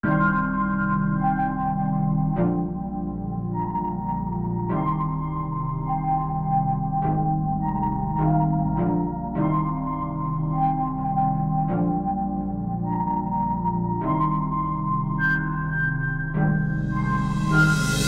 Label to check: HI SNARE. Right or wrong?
Wrong